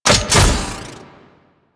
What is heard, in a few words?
Deployable Cover Open; Grineer